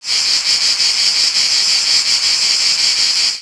far insects, ambient savanna; World of Tanks Blitz

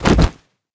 ground fall; Rust

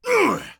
bear2 hit; Escape from Tarkov

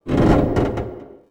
metal strain

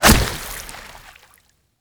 water hit, damage; DCS

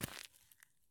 soil walk; Escape from Tarkov

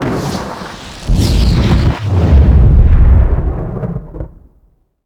mohole discharge

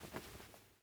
ak74u grab magazine; Rust

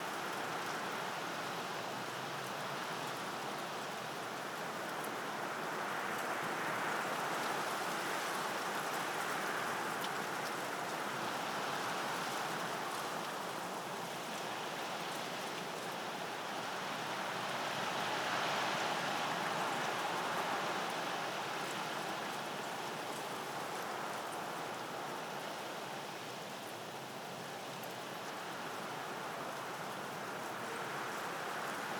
amb europe

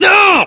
pain; english

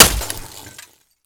light bulb break; Escape from Tarkov

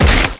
punch human

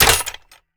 crossbow attack; Rust

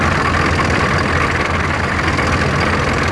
Tank Loop; Civ4 warlords

